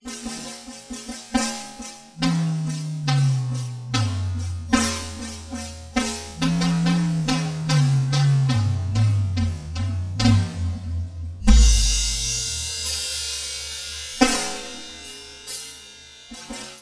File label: Drum Solo